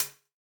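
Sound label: closed hat, 138bpm; Zero-G Sound Sense Grime Scene; Construction Kits; Da Suspects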